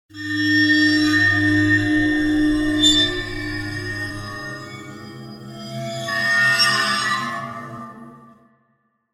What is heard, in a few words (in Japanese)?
怖い金属の音; 場面切り替えや心理描写; 演出効果音